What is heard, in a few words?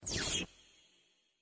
Crystal Appear Whiz